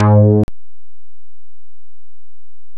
SAX BASS, Synth Bass

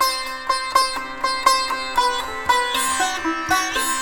SITAR, Indian Traditions

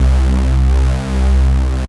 C Bass

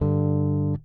Fifth One Shot GD, Bass; Bass X

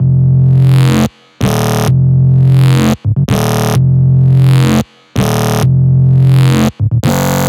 128 Fm, Melodic Loops; M8; Electronisounds

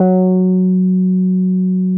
F#4, Bass; E-MU Producer Series Vol. 6 – Analogia Project (CD 1); Boom da Bass 3

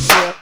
INS TIMB REV, TIMBALS, Ragga & Dub Reggae

